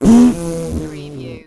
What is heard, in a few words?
CARTOON FART LARGE TRUMPETING